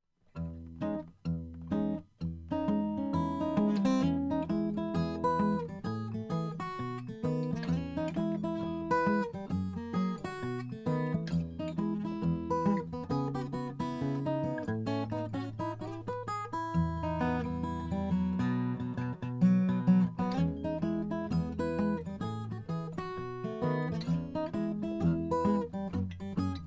froggy bottom sj deluxe mahogany

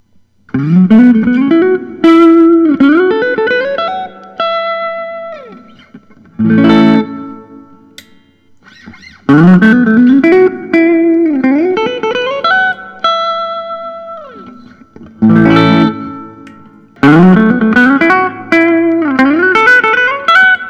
singlecut cherry burst, guitars; gil yaron

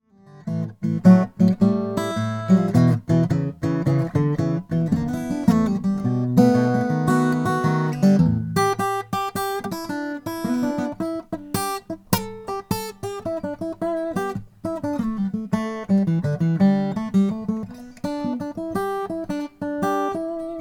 larrivee omv 10 with pickup

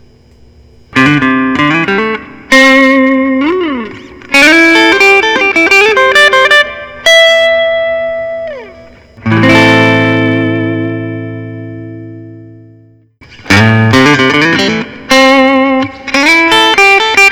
paul reed smith 408 semi hollow wood library; copperhead burst

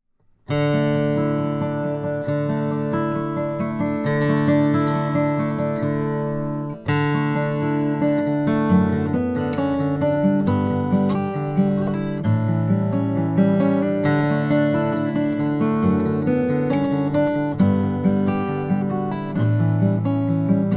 om 28 modern deluxe indian rosewood, guitars; martin

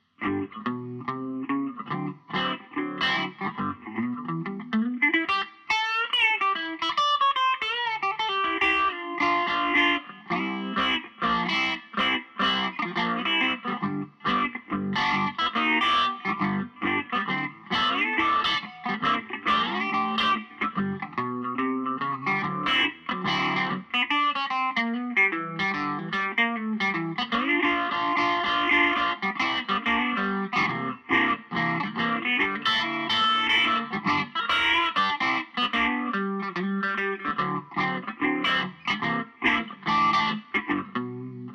gibson les paul custom black; 1975; jan

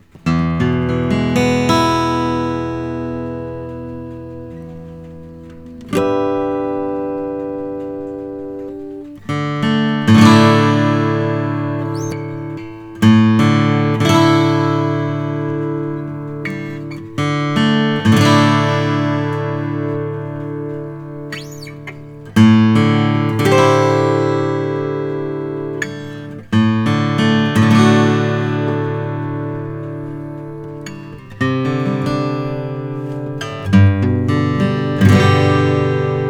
om2h wenge german spruce, guitars; collings

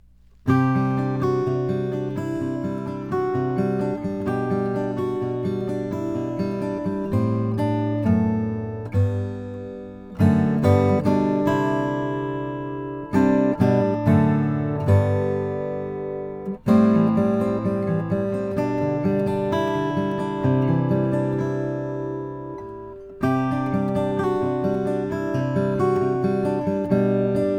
bruce sexauer ft 0 jb guatemalan rosewood

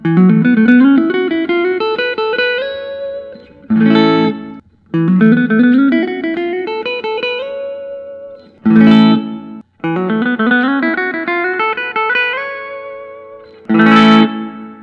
brazilian warm tobacco, guitars; bone 1; gil yaron

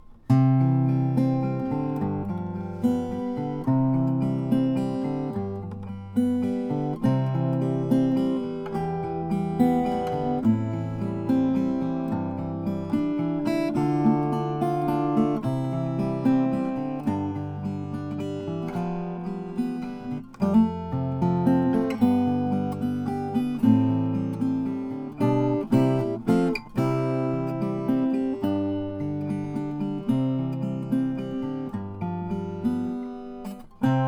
sheeran w01 walnut

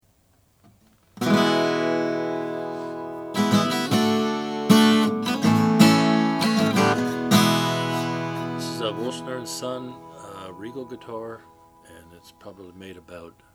wulschner son regal natural

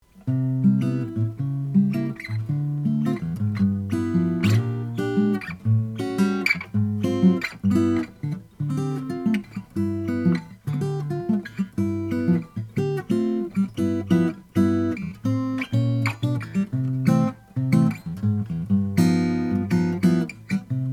p12 deluxe mahogany, guitars; froggy bottom

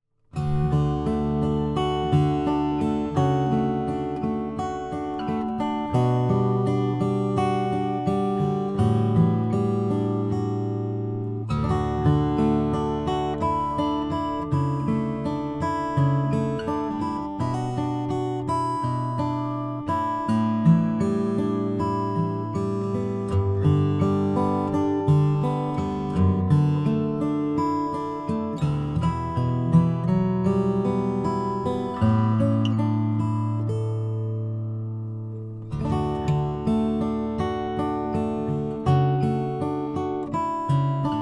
2003 schwartz pinnacle indian rosewood

